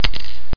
pin

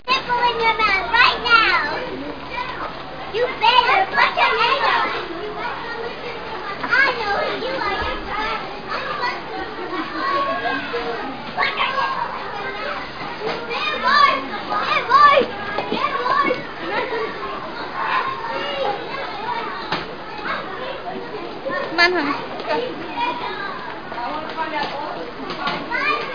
baby

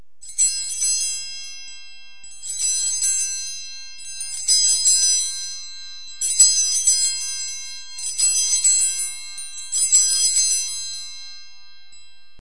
bell ice

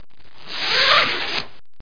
fasten

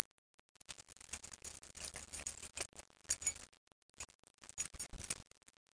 keys

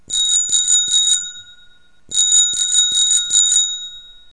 bell bike

